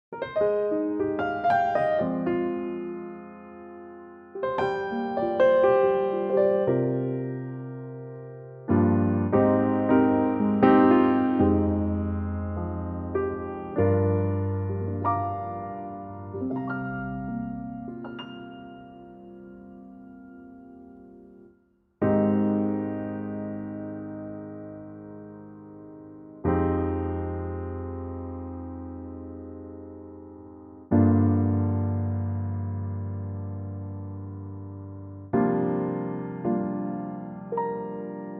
Eb piano

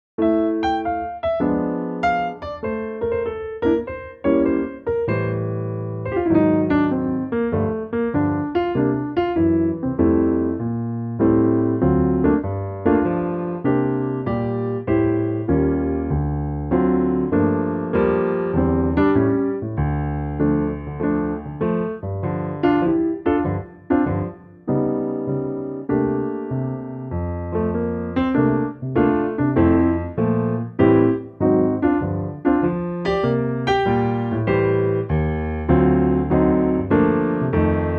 piano F; key - F - vocal range - Bb to E; Wonderful song from "On The Town"

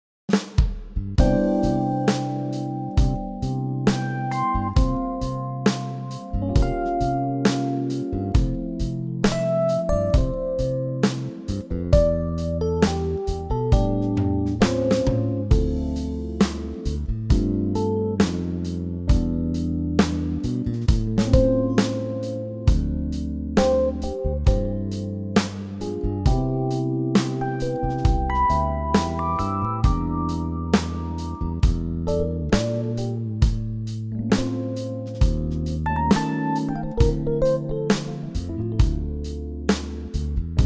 C smooth

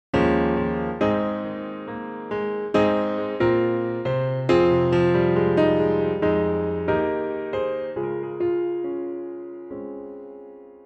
piano; Ab Pianotrak; key - Ab - vocal range - Ab to C

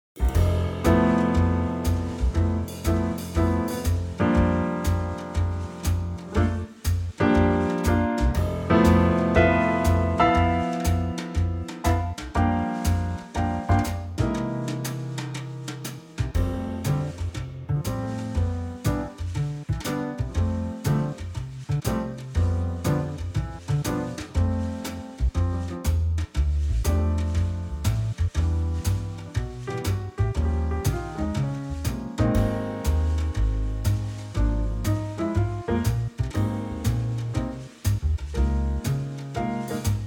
F trio swing

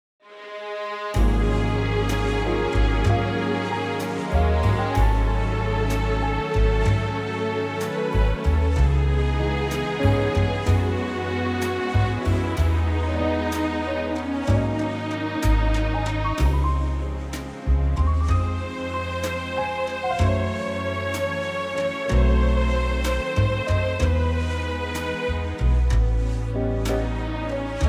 orchestral Db

